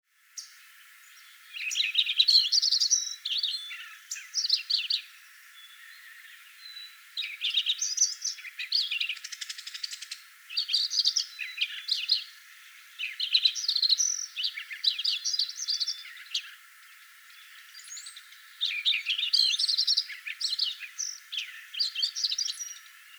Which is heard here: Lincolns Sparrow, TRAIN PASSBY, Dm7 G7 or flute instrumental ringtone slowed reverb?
Lincolns Sparrow